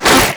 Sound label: Proto Infested Runner Arm Attack, Enemies; Warframe; Abilities